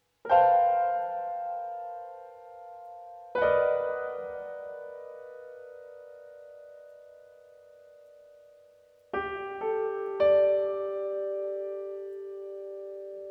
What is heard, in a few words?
Piano